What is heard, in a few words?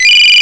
telephone